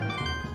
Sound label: confirm; fire emblem